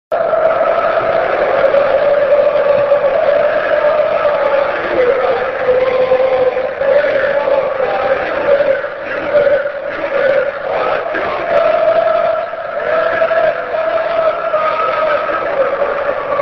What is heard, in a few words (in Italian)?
Forza Juve facci un gol; Cori sud